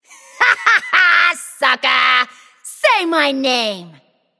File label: Kill Streak; Power-Up Hera